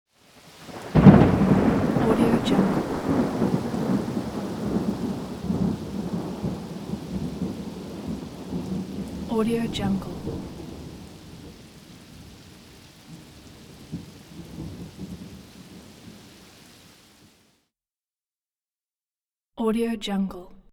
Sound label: thunder clap with rain